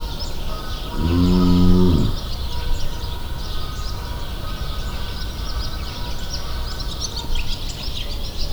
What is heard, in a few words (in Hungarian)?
bőgés féle, kardszarvú antilop; debreceni zoo 2012; marantz puska